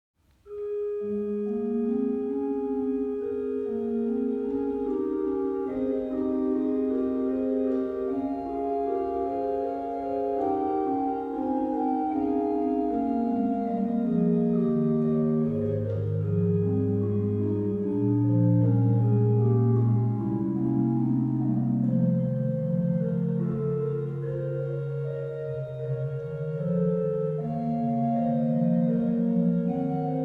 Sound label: Organ, Kyrie Gott Vater in Ewigkeit; Bach Organ Works Clavierbung III; BWV 672